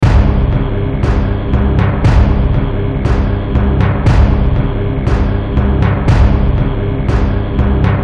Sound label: Bonus, pinball music; Medieval Madness